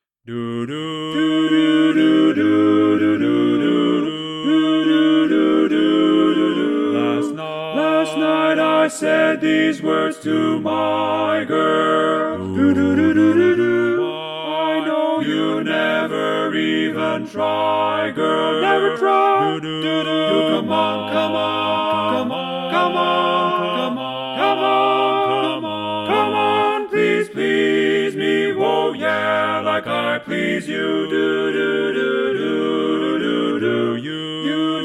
Tenor left

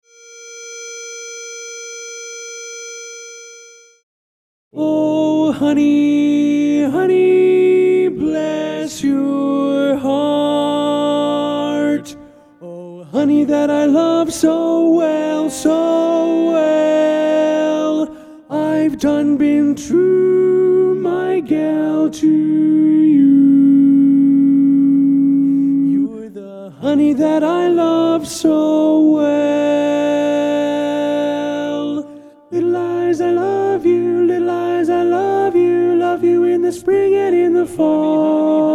Medley Tenor

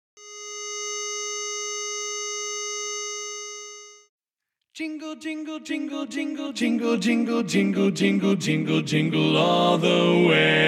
Jingle Bells Bass

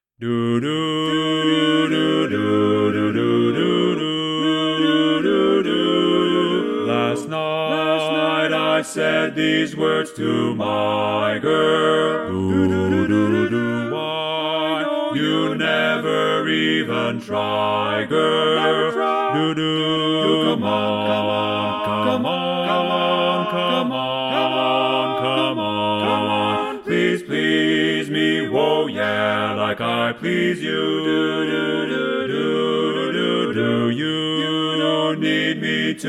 Bass left

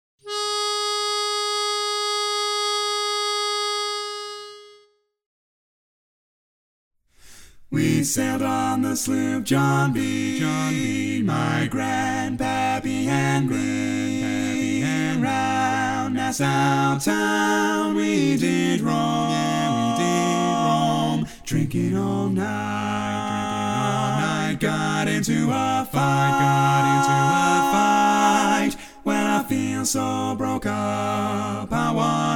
Sloop John B Bari missing

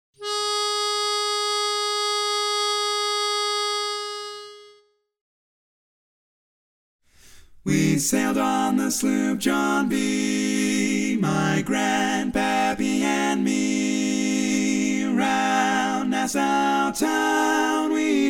Bass missing

